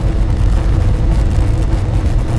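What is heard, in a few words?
electric pipe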